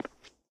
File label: concrete step; Golden Crayon Sounds